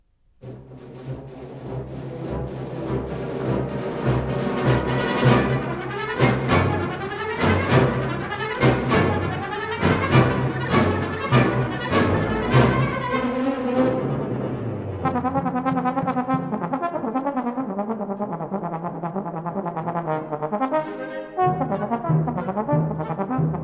Trombone Concerto, Presto

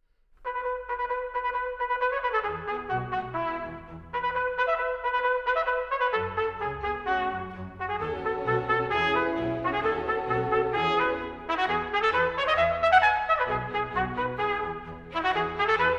Concerto in E major, Rondo, Allegro molto

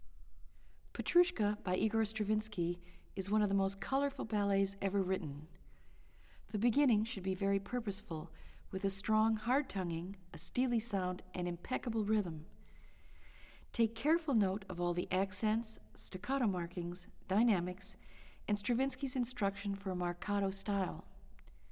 Orchestral Excerpts For Flute

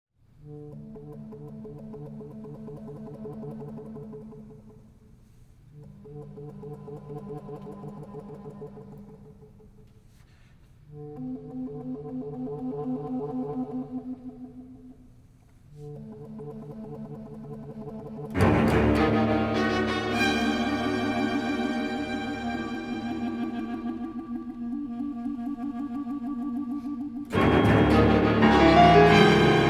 Concerto for Clarinet; Black Dog; X (Concerto for Clarinet) - Mvt. 1. Quarter = 56

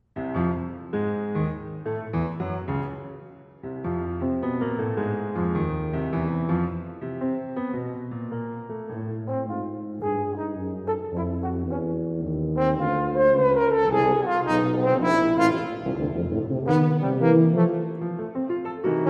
Suite for Horn, Tuba and Piano, Jazz style

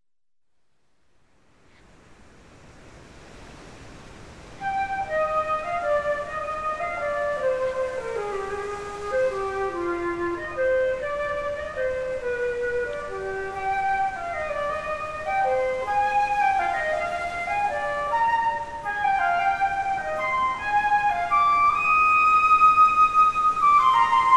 Suite in C Minor, Gigue; Suite No. 5; BWV 1011

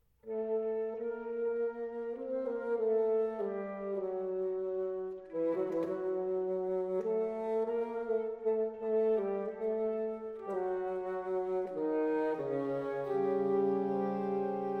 Woodwind Quintet, Ruminative

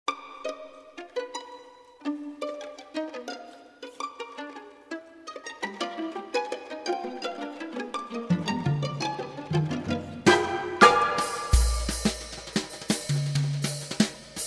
Concerto For Jazz Guitar

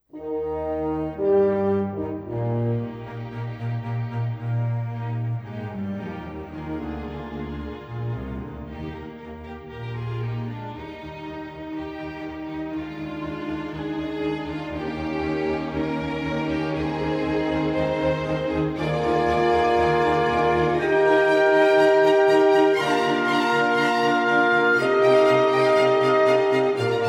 Concerto for Two Horns in Eb Major, Allegro

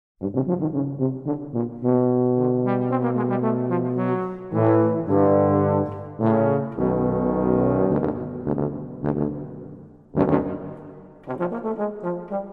Music 4 Tubas, Lively

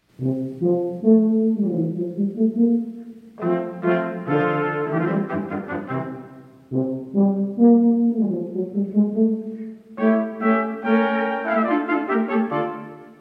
String Quartet, Allegro molto; Arnold Jacobs - Legacy Of An Artist

